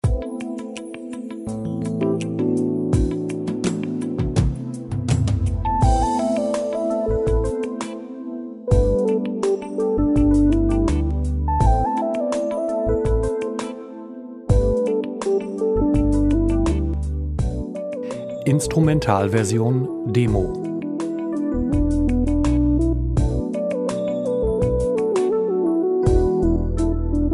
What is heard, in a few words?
Funky Chillout